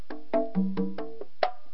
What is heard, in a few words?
beat bongo